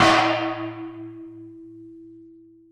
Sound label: aluminum, Ticks, Misc Percussion